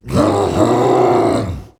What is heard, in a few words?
burning